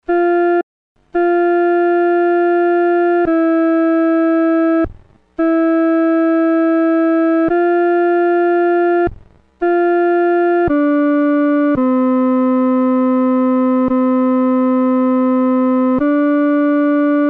阿门颂 独奏（第二声）

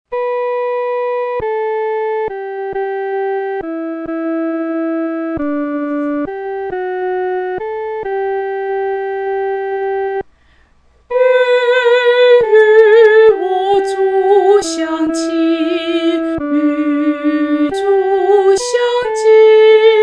独唱 第一声; 与主相亲

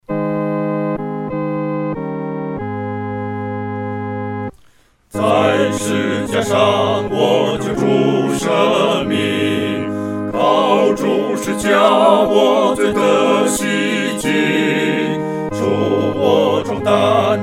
合唱 四声部; 荣耀归主名

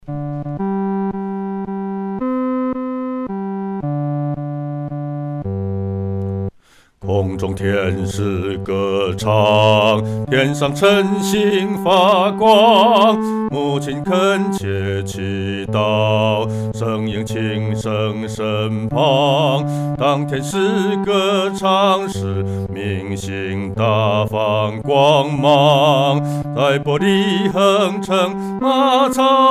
独唱 第四声

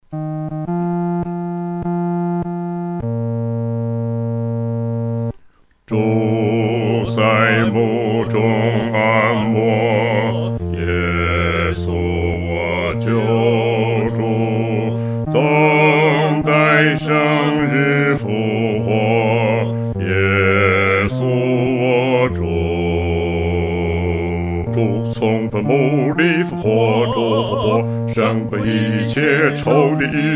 独唱 第四声; 基督已复活

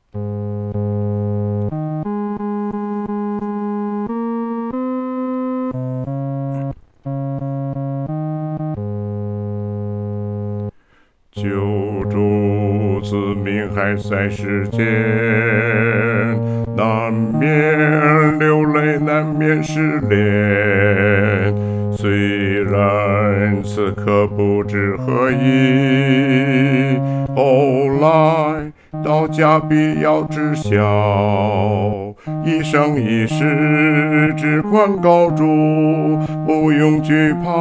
独唱 第四声

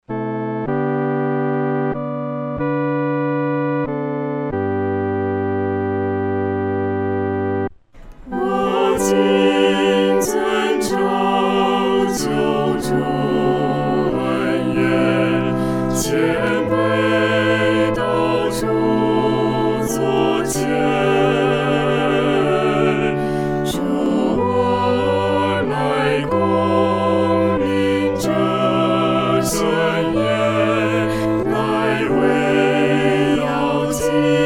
合唱 四声部

